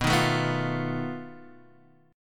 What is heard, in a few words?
B 7th Flat 5th B Guitar Standard; B7b5 strummed; 7,6,7,4,6,x; JGuitar